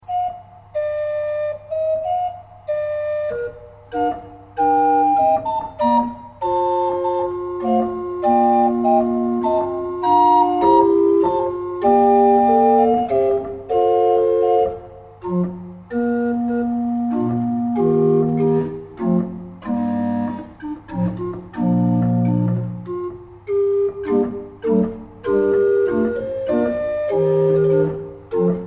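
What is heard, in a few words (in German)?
Gedeckt 8, Orgel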